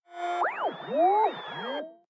flying robot; factorio; Factorio 0.14.21

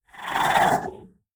biter call; factorio; Factorio 0.14.21; creatures

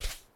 sand, walking; factorio; Factorio 0.14.21

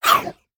spitter death; factorio; Factorio 0.14.21; creatures

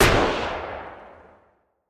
heavy gunshot; factorio; Factorio 0.14.21; fight

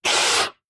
biter roar; factorio; Factorio 0.14.21; creatures

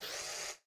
inserter basic; factorio; Factorio 0.14.21

